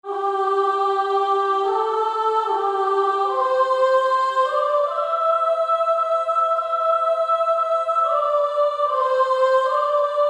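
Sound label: Female Choir, soprano 1; More Love to Thee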